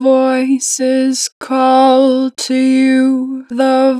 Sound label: Voices - 2 bar vibrato, Vocals, Natural Vibrato; Harmor Vocals